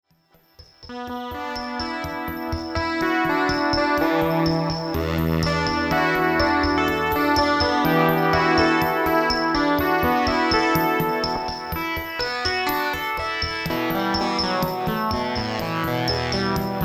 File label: OB-Xa PWM Perc Brass Bell String